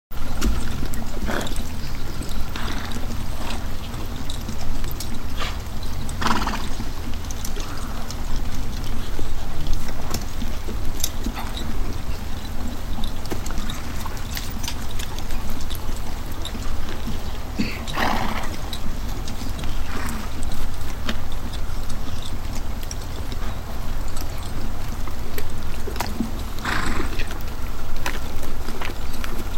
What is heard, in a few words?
Infantry And Cavalry Stand Idl; Historical; Hollywood Edge Historical Series; War Horses Armies & Weapons